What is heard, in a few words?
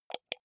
computer mouse wheel click